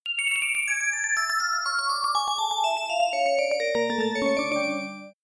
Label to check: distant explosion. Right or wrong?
Wrong